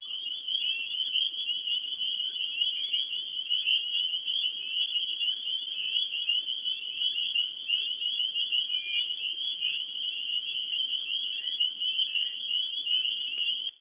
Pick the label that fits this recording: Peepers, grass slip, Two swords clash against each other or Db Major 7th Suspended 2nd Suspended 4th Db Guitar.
Peepers